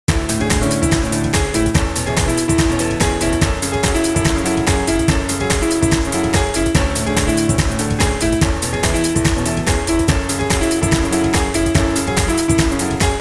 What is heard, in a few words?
piano flat; banbard